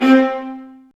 STR VA3JE11L, Roland LA Composer strings; Roland - LA Composer vol. 1

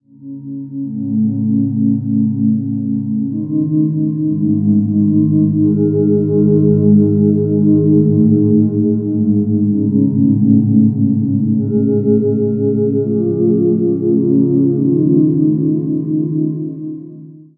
Ambi Cloud, Keyboards; Sonic Foundry (Sony Creative Software); World Pop

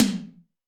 Tom Hi Stereo, Alesis D4

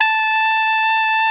TENOR MF A 5, Saxophones; Greytsounds; Philip Wolfe David Crozier's Saxophones

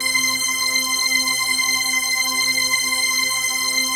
PHASE PAD

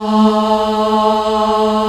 FEMALE A2, choir

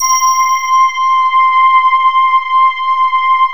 DIGI BLEND, Emu Classic Synths; E-MU Formula 4000 Series Vol. 3; Analog Odyssey

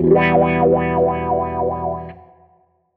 SG Guitar Loop

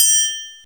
SP1200, DRUMMACHINES

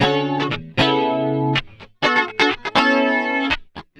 Light Machine, Guitars

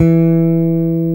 BS 5 STR PB, E. Bass, P. Bass; Roland - Rhythm Section

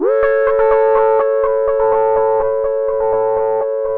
Analog Rhythm

